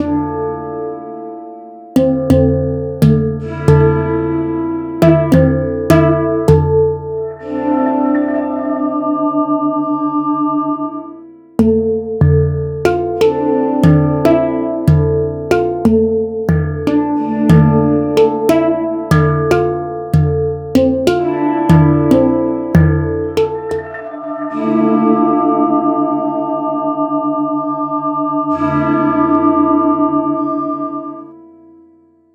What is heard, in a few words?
hang drum